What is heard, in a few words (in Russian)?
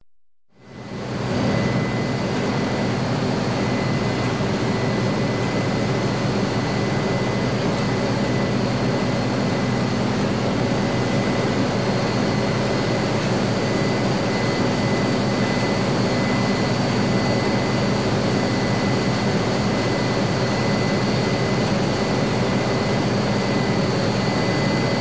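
шум и гул работающих генераторов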